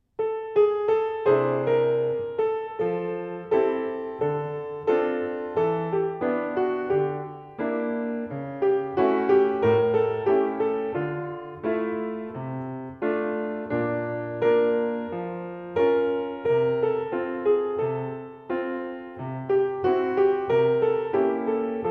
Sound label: piano; grenouille